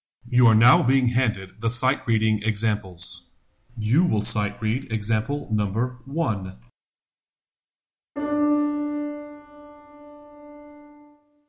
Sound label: Sightreading Example Alto